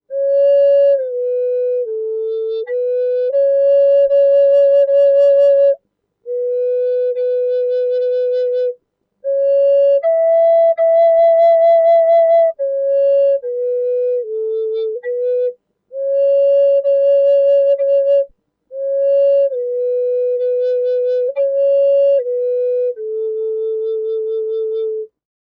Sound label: Mary Had A Little Lamb Key F Sharp, flute